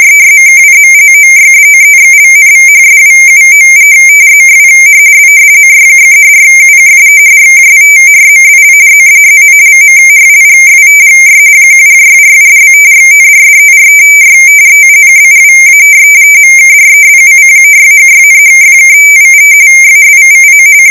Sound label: Afsk 22 ms Per Bit, rtty